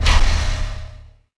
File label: repulsor Pallet stop; machines mechanical